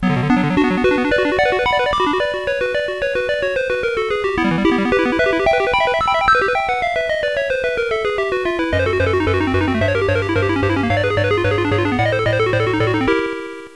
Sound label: tune; Games.Rick Dangerous